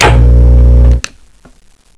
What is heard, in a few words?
button